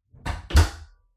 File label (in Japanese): 扉を閉められる音